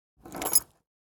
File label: Key Pickup